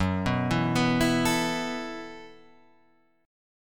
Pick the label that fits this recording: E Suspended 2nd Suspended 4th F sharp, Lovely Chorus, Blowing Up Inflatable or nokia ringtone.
E Suspended 2nd Suspended 4th F sharp